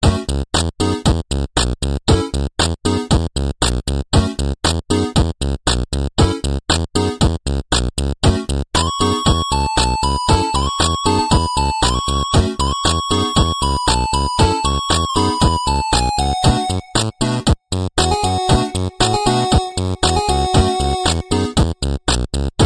8-bit muzyka na sotovyi telefon ringtony

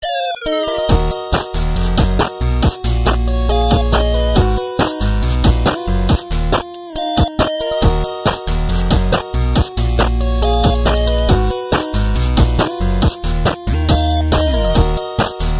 8-bit muzyka na sotovyi telefon ringtony

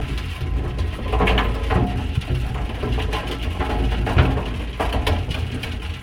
zvuki bochki

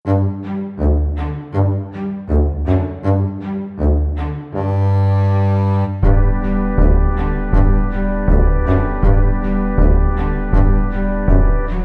smeshnaia muzyka dlia fona bez slov

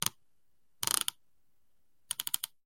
zvuki podzornoi truby

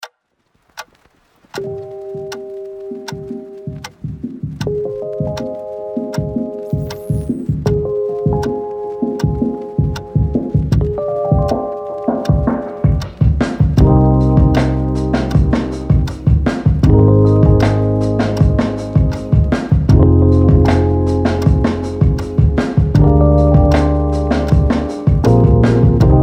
muzyka bez slov; dlia iandeks dzen; bez avtorskikh prav

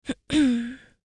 zvuki pershenie v gorle kkhm

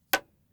zvuki gubnoi pomady

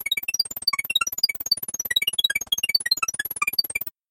elektronnye zvuki peredachi dannykh

